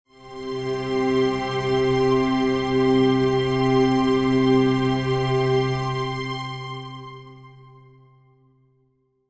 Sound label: Music End Strings; Void Bastards